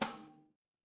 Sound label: impact metal hit